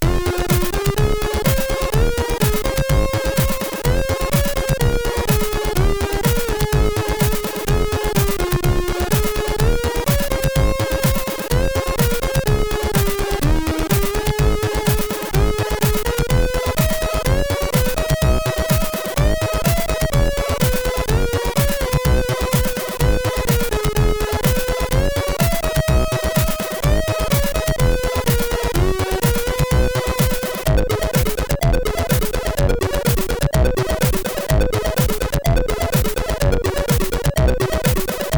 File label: music, sid, MOS6581R3